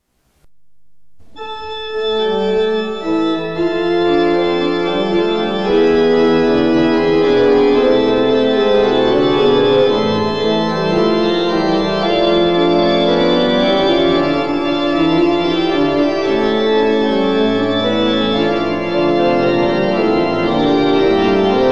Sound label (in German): Choralvorspiel; Bach; Christ ist erstanden